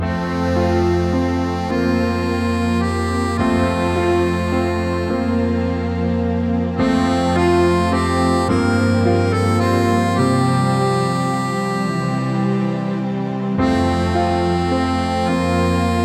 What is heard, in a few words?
tender love waltz